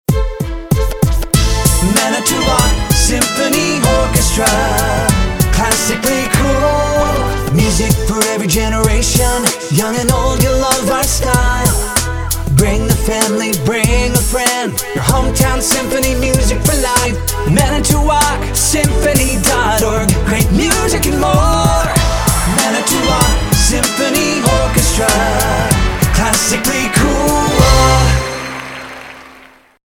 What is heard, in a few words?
Jingle